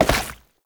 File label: Step Goo, Foley; Warframe; Oraxia